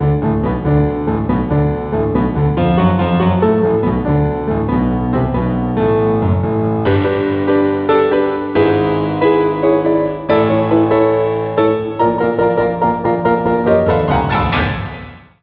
Piano; Level 8; X mansion

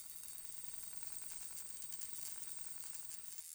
Light Hitting Spirits

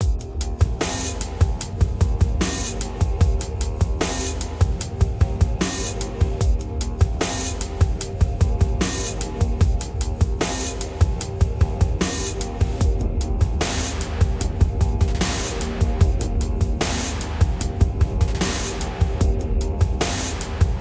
Combat; Necropolis

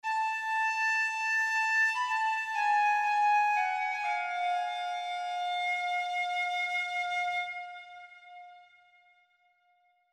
calliope flute; God of War Chains of Olympus; psp

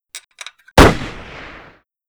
Gun Shot muffle

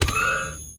Gems Transform; Puzzle Chronicles; PS3; PSP; X360

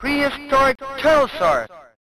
Level Intro; Prehistoric Turtlesaurus

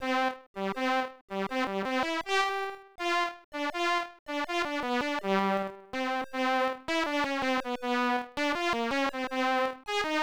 Violin; doukyusei pc 9801

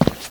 Footstep rock; this is the zodiac speaking 2020 gamerip switch ps4 xbox one pc macos

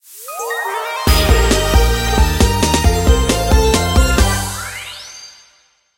Level Up Jingle; My Tamagotchi Forever; android; GSFX Level Up Jingle

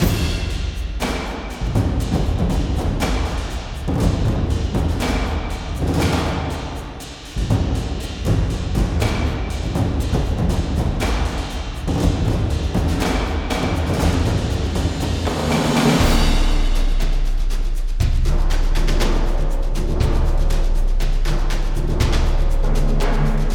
Battle Submix; crush your enemies

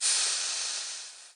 sizzle; gamerip; temple run

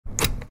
lotus lever

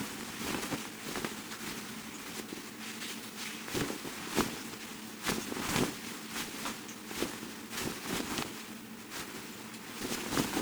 Ambience tents

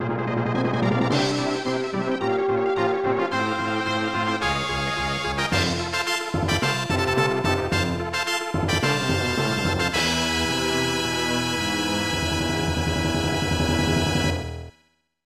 Map Clear; Bounty Sword; SNES

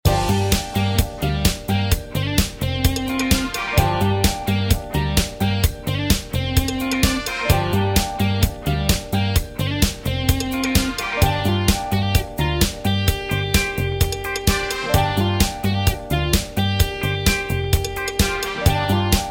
Game Complete and Credits; Despicable Me Minion Mania

